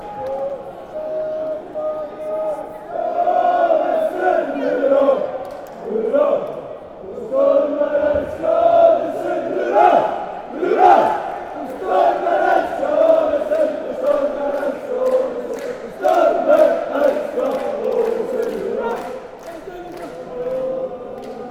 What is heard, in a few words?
chant, fanchants; aalesunds